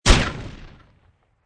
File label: Explosion Grenade Flash Bomb Distant, Medium explosion, sharp blast as a grenade or flash bomb blo; Bombs